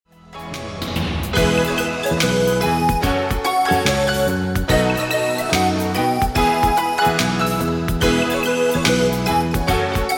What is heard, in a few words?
Karaoke